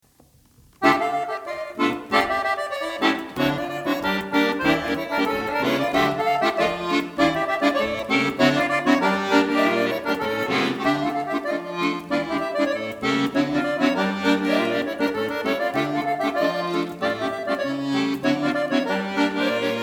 Balkan Impressions

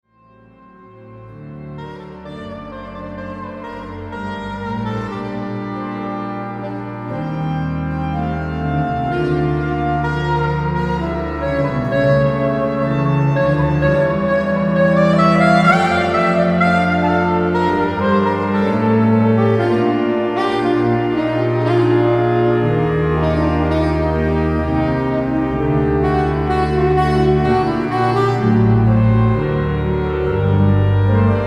Largo Praeludium in g; buxtehude

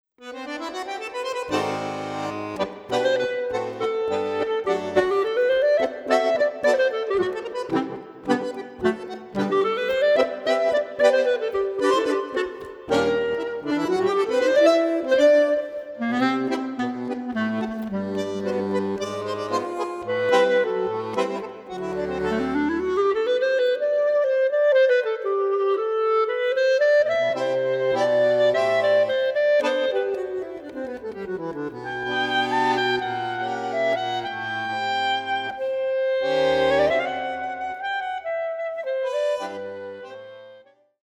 Tango

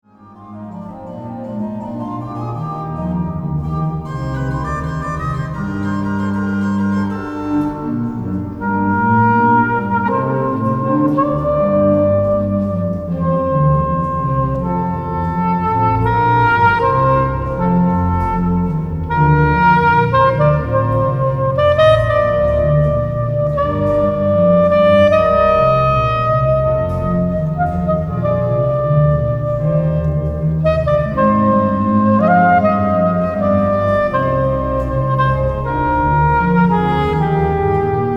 Allegro Praeludium in g; buxtehude

